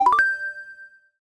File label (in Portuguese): Alavanca